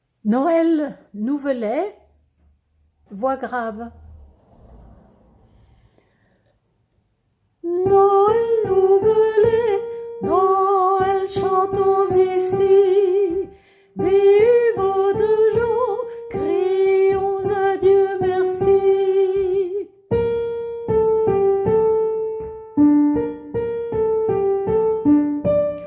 voix grave; noel nouvelet

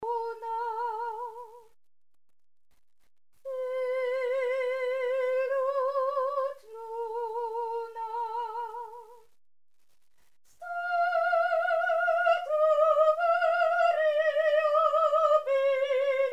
soprano; Carmina Burana

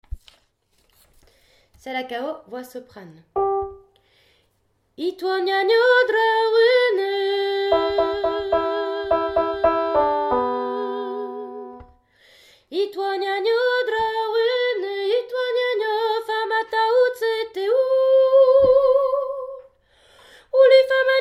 Soprane; Salakao

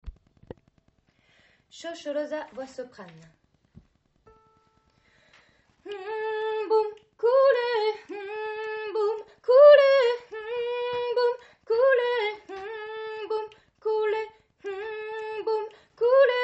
Soprane; Shosholoza

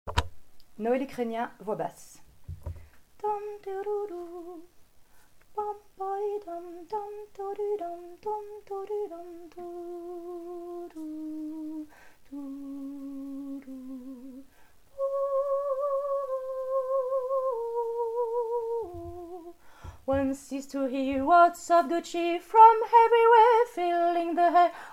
basses; Noël Ukrainien

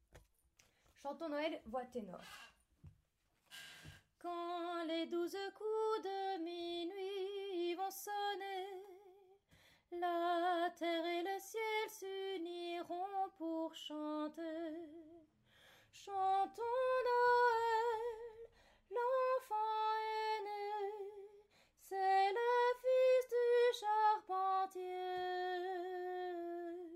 Tenor; Chantons Noël

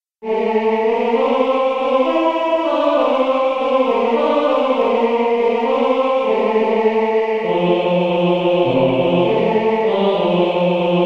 basse

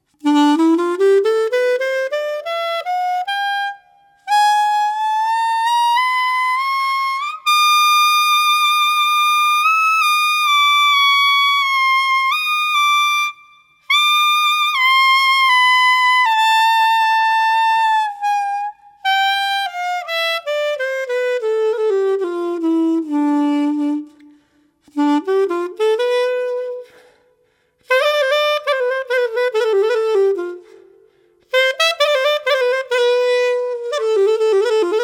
Clarinette

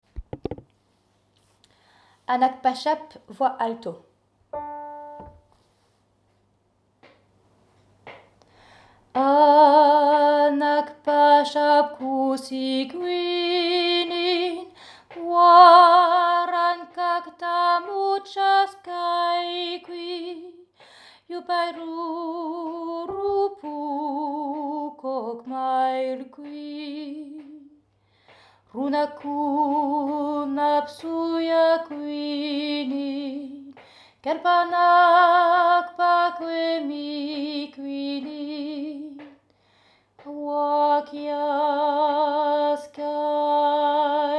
voix Alto; Hanacpachap

